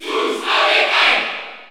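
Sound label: Cheer French, crowd; Ike; SSBU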